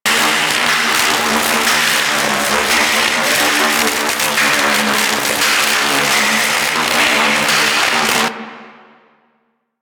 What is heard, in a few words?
Corpus Railjack Electric Hazard Loop; Ambience; Warframe; Tether Power Plant